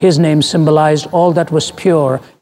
Vocal Phrases; Vengeance Samples; Vocals

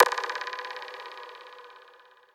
Minimal Perc; Minimal Percussion

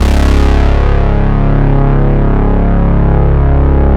Vengeance Ultimate Bass C#, Root C#

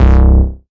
Bass C, Offbeat Bass

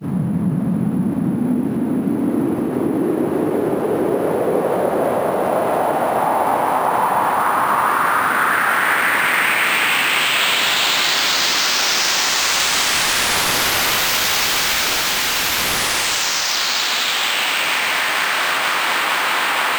FX Uplifter

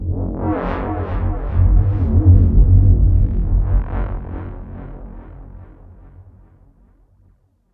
VFX Atmos

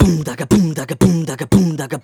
Beatbox Loops, Human Beatbox

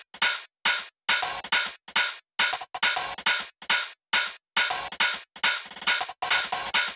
Soothsayer Kit 138 BPM Loop, Drums & Perc; Bminor

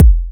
126BPM Kick; Vengeance Samples; Tech Freak Kit

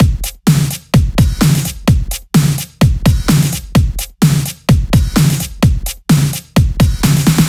Housebeats 128BPM; Vengeance Samples

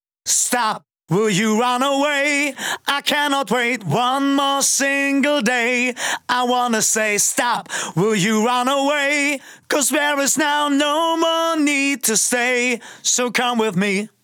Male Vocals DRY, 140 BPM; Vengeance Samples; Vocal-Hook Kits; Song Kit; Stop!

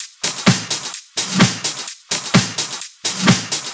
Houseloops 128BPM; Vengeance Samples

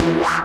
Synths E; vengeance essential club sounds-3

